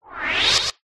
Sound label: sound design sweep white noise filter